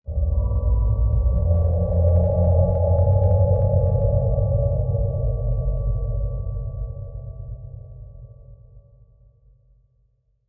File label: stinger; Joe's Diner